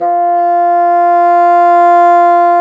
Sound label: BASSOONS, Bassoon Swel; Roland LCDP04 Orchestral Winds; WND Bassoons